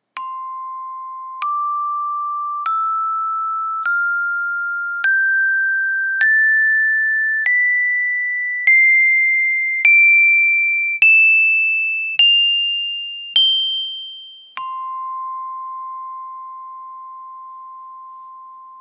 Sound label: Rubber Club